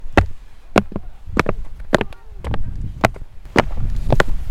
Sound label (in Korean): 나무다리 건너, beach